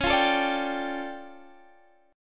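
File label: D Minor Major 11th, D flat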